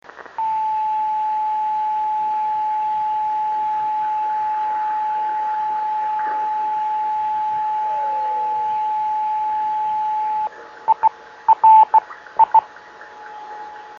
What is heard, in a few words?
26510KHz, driftnet beacons